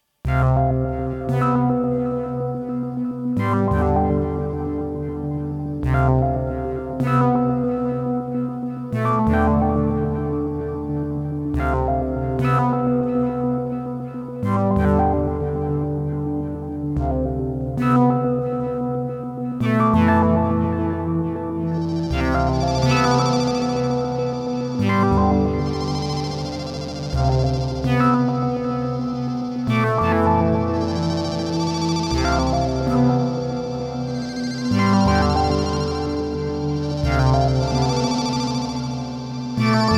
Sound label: Curved Moog Under a Raincloud